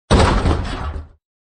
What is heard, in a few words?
impact Metal